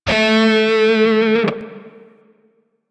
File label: Shawzin Flourish Oct Two A; DE; Warframe; Grineer Shawzin